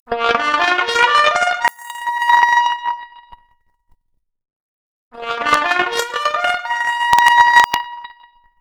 Trumpet arp, Stereo output noised; 4bit

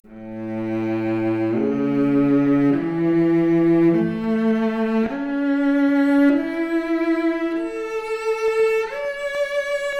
Violoncello arp, Stereo output noised; 4bit

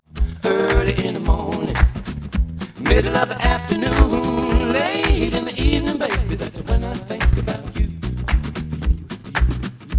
noised; 3bit

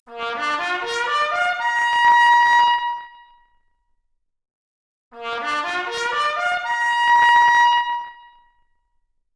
Trumpet arp, Stereo output noised; 3bit

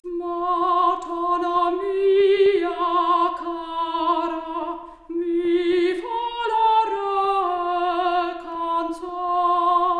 Soprano Stereo output noised; 3bit

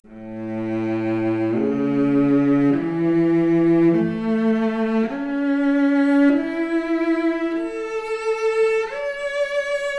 Violoncello arp, mp Stereo output, 4bit

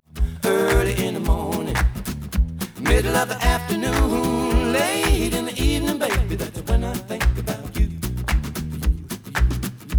Stereo output noised; 3bit

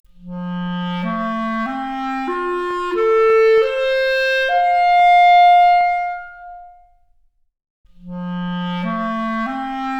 Clarinet arp, Stereo output noised; 3bit

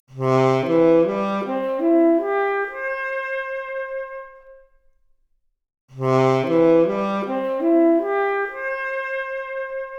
Saxophone arp, Stereo output noised; 4bit

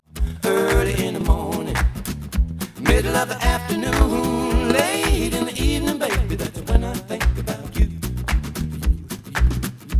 noised; 4bit

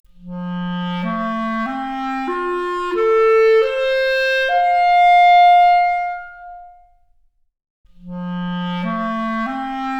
Clarinet arp, Stereo output; 3bit

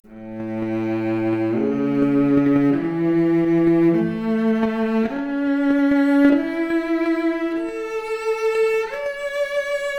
Violoncello arp, mp Stereo output noised, 3bit

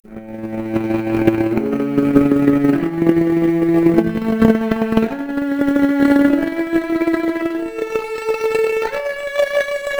Violoncello arp, mp Stereo output noised, 3bit

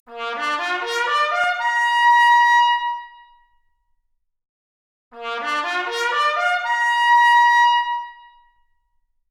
Trumpet arp, Stereo output noised; 4bit

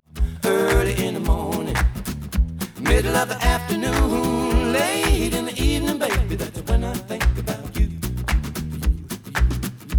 Stereo output noised; 4bit

